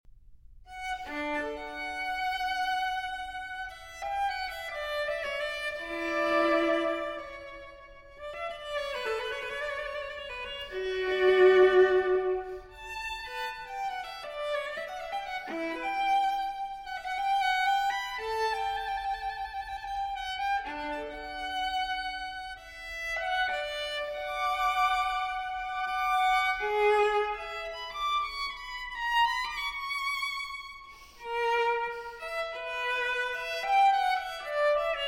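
Cello Suite No. 6 in D Major Transcription For Viola Allemande; J.S. Bach; BWV 1012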